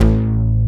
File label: NOIZE BASS; Masterbits; Dance Trax